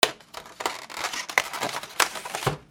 KITCHEN

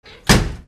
DOORS

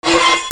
FRICTION

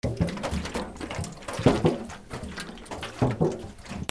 GARAGE

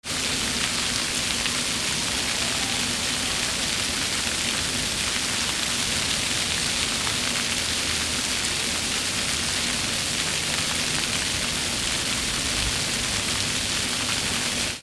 RAIN

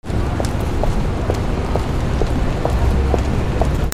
FOOTFALL

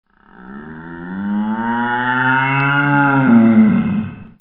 ANIMALS

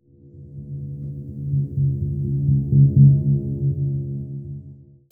MUSICAL

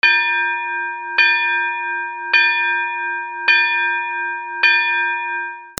BELLS

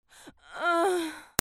PEOPLE

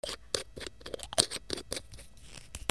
HOUSE

